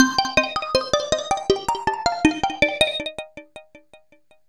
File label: synth, ambient, 160, C; Keys; eJay